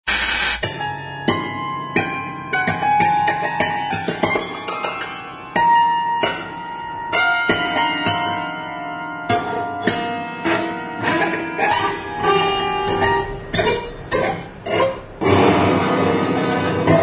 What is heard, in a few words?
[instrumental]